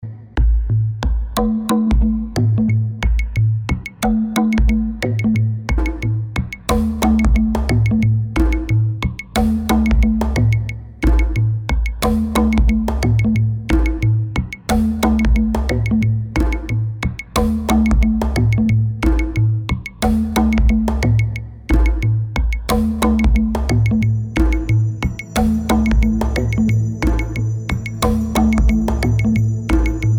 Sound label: Drums